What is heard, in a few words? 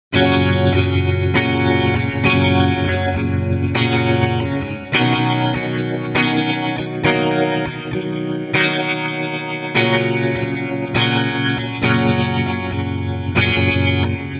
Tremolo Dry Wet; Virgo